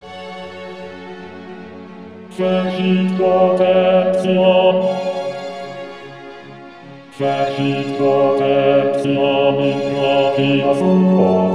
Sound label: bass ten; fecit potentiam